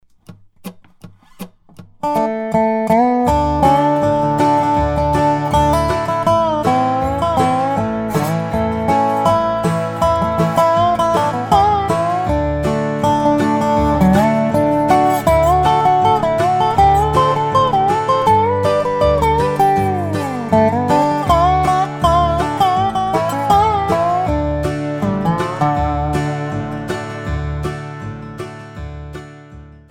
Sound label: Key of D Dobro and backing track